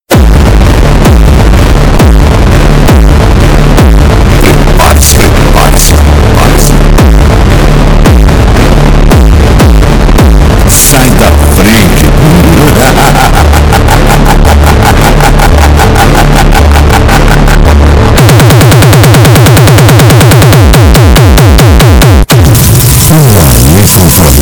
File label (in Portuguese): Musica para racha de som graves